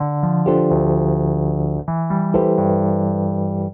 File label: Jazz Keys El Piano 128 DE, Chordy Loops, 128bpm